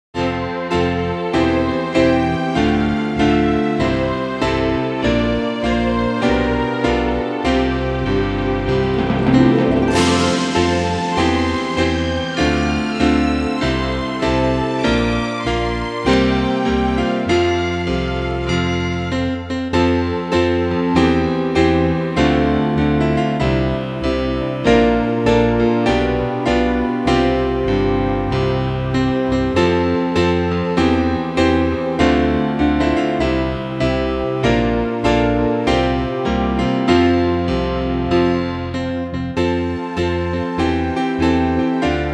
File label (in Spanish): Himno al Fundador Instrumental